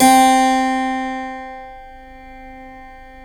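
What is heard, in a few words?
C3 clavinet left, Keyboards Acoustic; USB Soundscan vol.17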